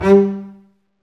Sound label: STR, STRINGS; Roland - LA Composer vol. 1